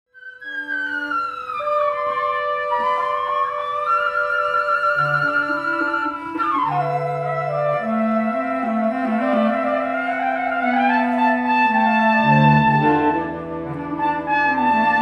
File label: Quintet